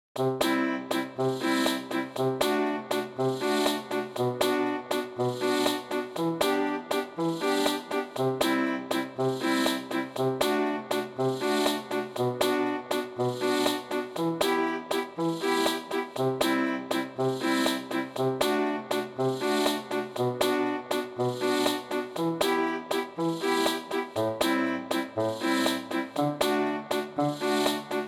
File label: Fun village no melody